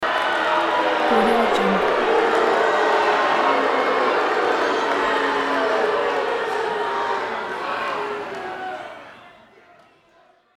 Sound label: crowd boos during fight; hollywoodedge